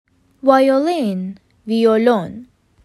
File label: Violin